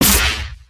Barrier Shock, ambience spaceship

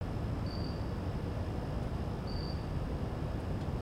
city loop; nimbus station

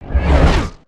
asteroid whoosh by

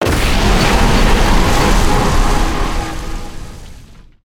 Siege Tower Impact; destructibles

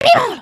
dice roll

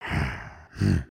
Sleep; creatures organic

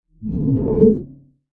Fly By Gong

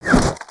Spear Mid; weapons; aronwk collection; ndaudio

